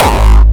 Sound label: 2010 style kick g